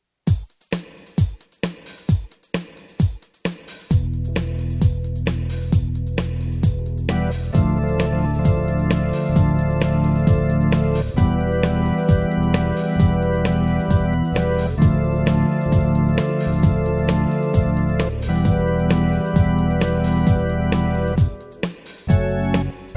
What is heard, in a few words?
Pushback Instrumental